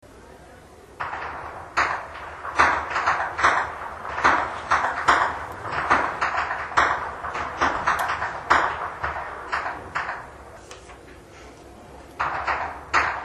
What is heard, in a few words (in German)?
schnalzen, flachgau; fuerstenbrunn